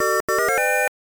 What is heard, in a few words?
Challenging Stage Start; namco nd 1 arcade; namco classic collection vol. 1